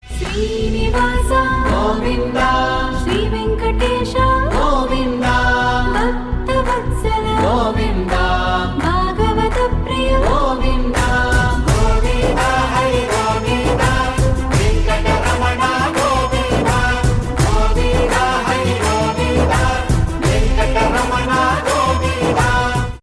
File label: Ringtone Female